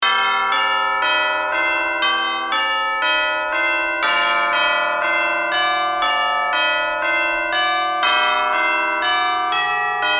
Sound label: Church bells ringing chimes wedding; large bell